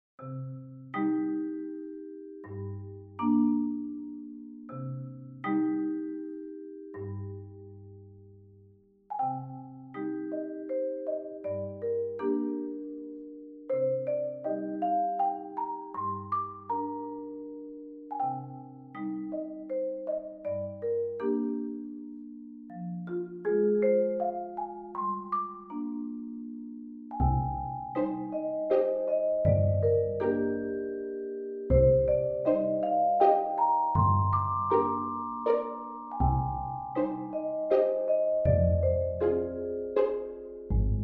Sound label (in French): Percussions